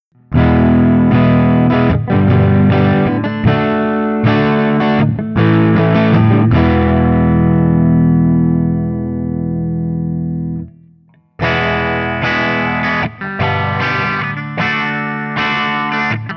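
Guild Burnside Crossbow Stock ODS100 Open, Clean, Open Chords; Burnside (by Guild) Crossbow; Open Chords #2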